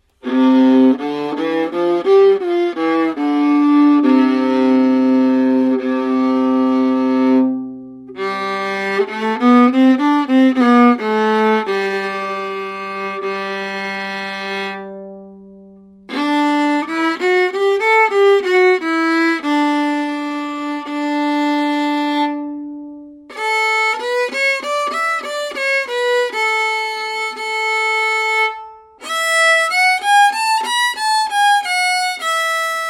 Fiddle scale

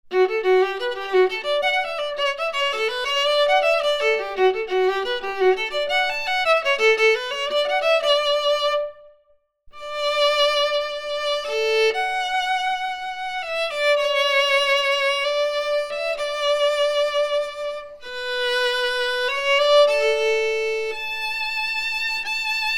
Viola w ambience

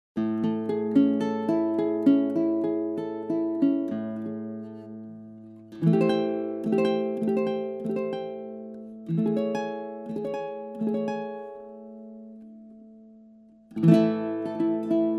uke

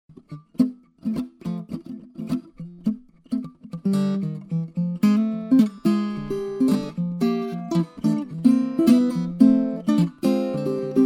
uke

